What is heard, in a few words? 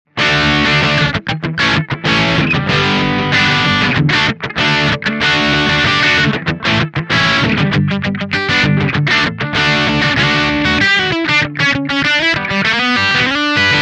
Bypass; MAXON AD999